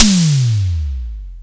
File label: Long Decay, Simmons Drums